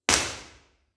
Recital Hall Curtains, Omni; room survey; Messiah College

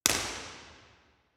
Parmer Live, Omni; room survey; Messiah College

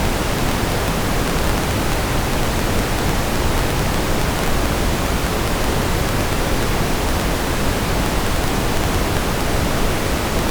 M-Noise; loudspeaker maximums; peak SPL; loudspeaker maximums part 2

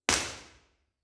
IR Recital Hall Curtains XY STEREO; room survey; Messiah College

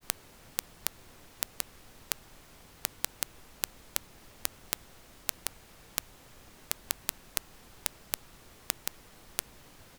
Dirac Pulses Embedded; loudspeaker maximums; peak SPL; loudspeaker maximums part 2

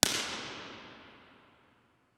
IR 10m Omni, room survey, fieldhouse; Hinkle Fieldhouse

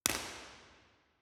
Parmer Live, B-Format; room survey; Parmer Hall; Messiah College

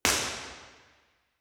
IR Recital Hall Live XY STEREO; room survey; Messiah College

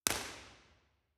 Parmer Curtains, B-Format; room survey; Parmer Hall; Messiah College; Parmer Hall Dead

